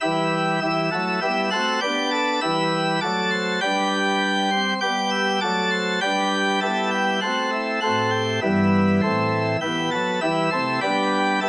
blessed be that maid, organ

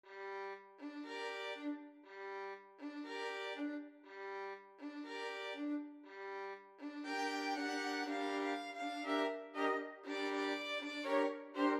violin ensemble

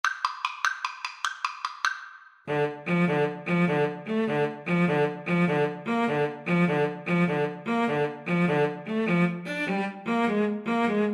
Viola Cello Duet

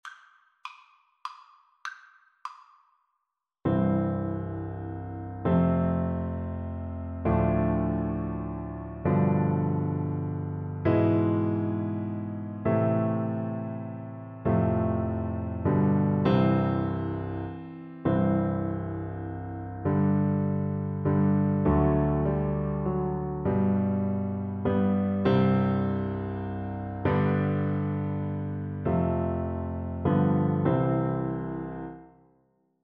Oboe

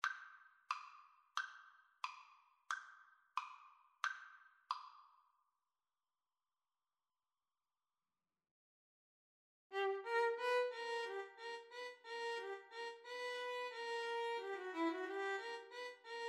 bartok romanian folkdance, violin cello; Violin-Cello Duet

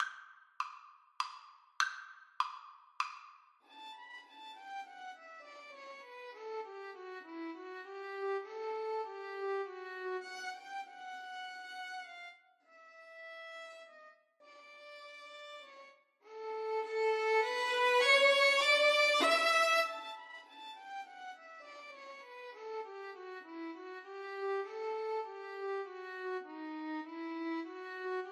violin duet

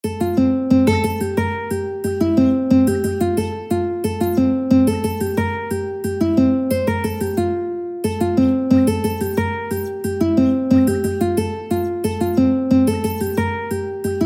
ukulele

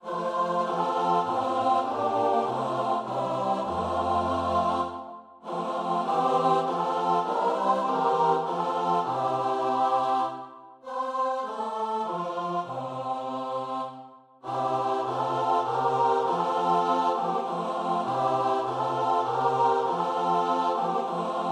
choir

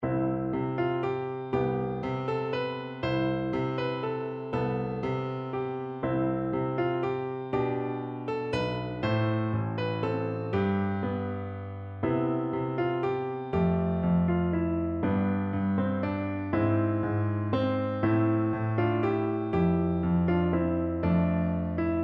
PNO

